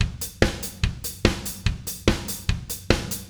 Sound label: 145 Offbeat Drums Verse, Very Fast Tempo; Pop Rock; Pro Tools Essential Loops; Digidesign